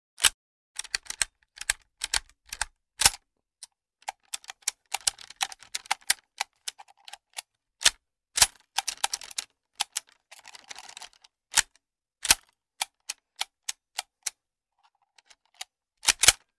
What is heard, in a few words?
22 Ruger Rifle Bolt, foley gun movement